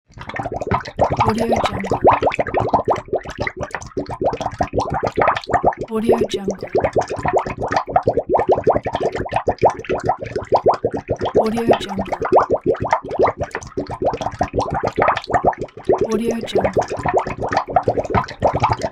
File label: cartoon bubbly water; apple hill studios